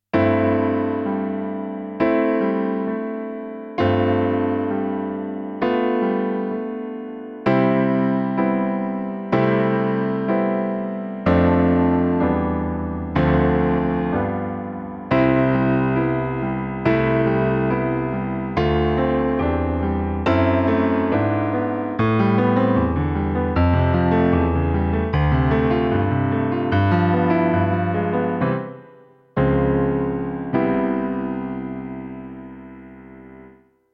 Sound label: karaoke